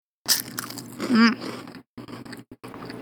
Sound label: (Eating); Marisa